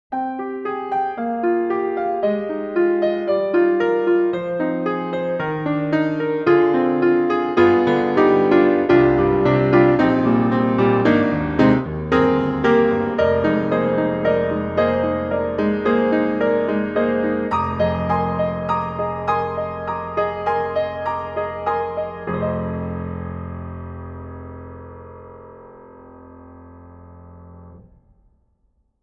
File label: 1955 Steinway D room; Embertone; Walker